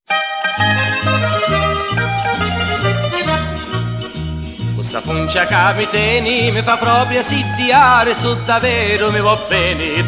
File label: folc siciliano Tarantella; italianas